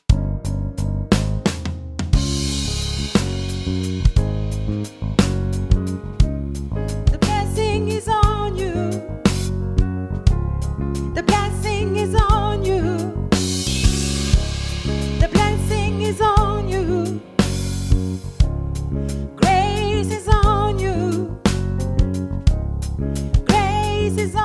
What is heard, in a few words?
Alto, Gospel